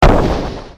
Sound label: Attack